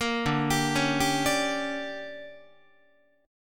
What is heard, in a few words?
D Major 7th Suspended 4th Sharp 5th, Guitar; DM7sus4#5; arpeggiated; JGuitar